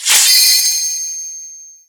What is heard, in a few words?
notification alert